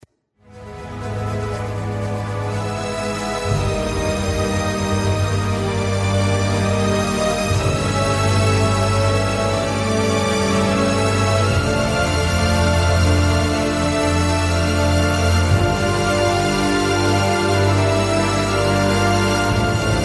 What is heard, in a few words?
ringtone; the crown